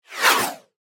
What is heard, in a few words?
Multimedia Action, Technology, Multimedia, Action, Element; Alert Sound Effects; Alerts (Sound Effects:Multimedia); BlastWave